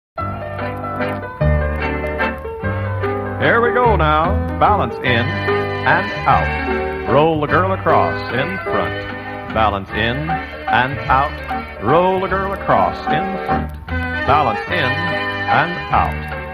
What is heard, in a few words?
bingo waltz (prompted)